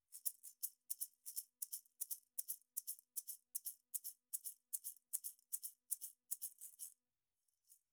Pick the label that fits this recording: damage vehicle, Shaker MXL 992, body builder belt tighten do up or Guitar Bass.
Shaker MXL 992